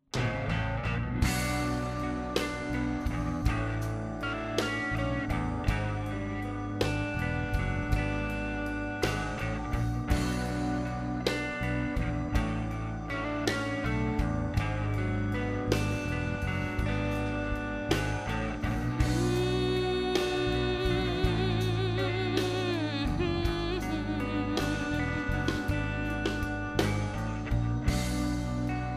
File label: Slow Blues